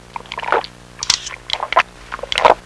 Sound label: drinking; People; Sound Library 2000; Wayzata Technology